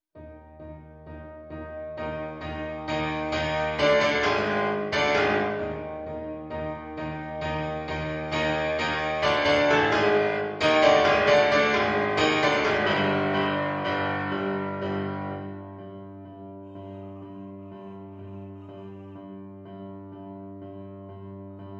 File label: Ode piano accomp; wordsworth